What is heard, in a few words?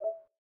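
Cursor Refine; PlayStation 4; system software sounds effects; ps4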